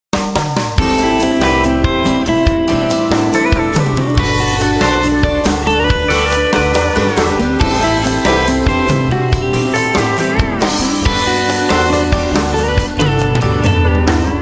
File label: Tropical Country